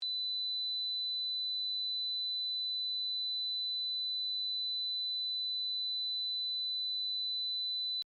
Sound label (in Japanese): 4000HZの音